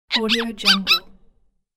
happy cartoon creature whimps

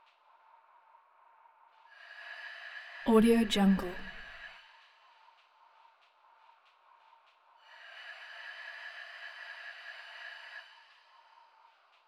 washy breathing

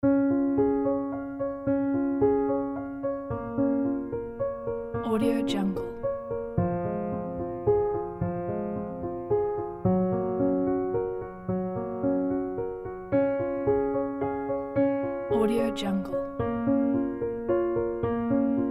soft beautiful piano

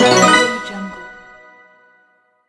the happy win game

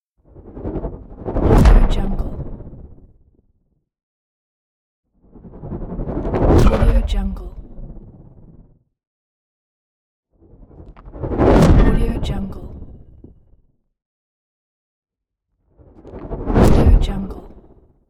spinning flaming whoosh big impact

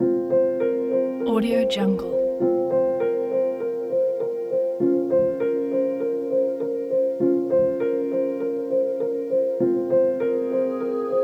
dramatic ambient piano; reformesound

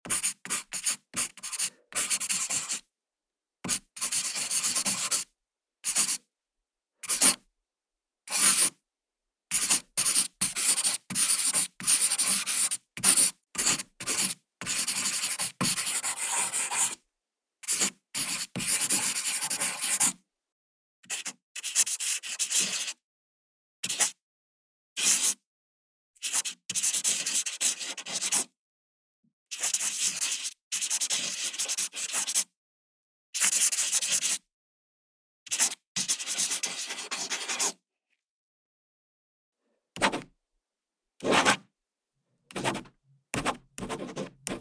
pen writing; 81inc

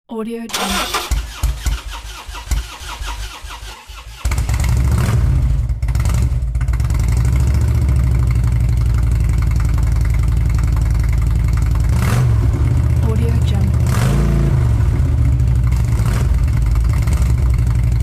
engine start

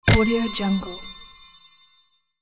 magical fantasy hit

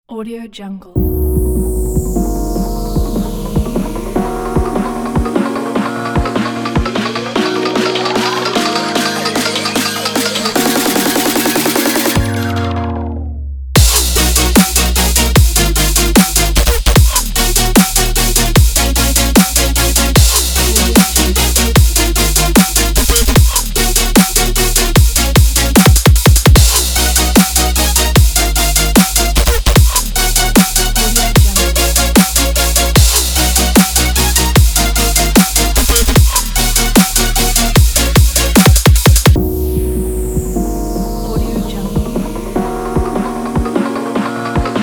the dubstep

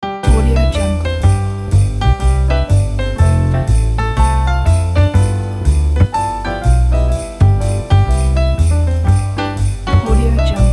christmas jazz jingle bells cover; dspromusic

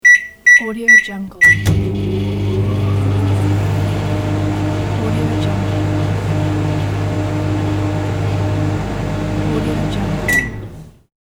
microwave oven

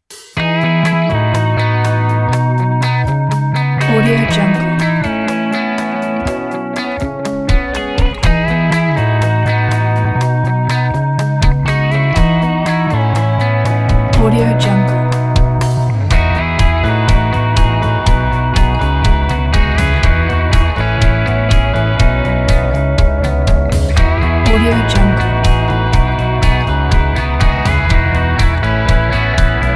uplifting country pop

